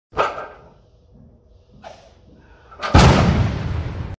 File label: Weightlifting; وزنه برداری